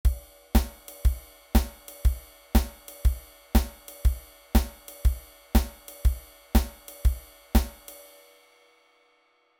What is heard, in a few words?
midi